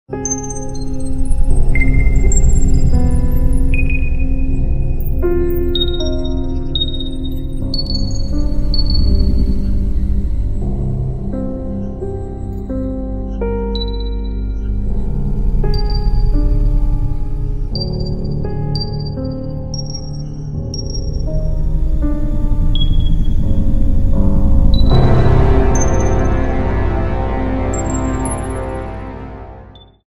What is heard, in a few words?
instrumental ringtones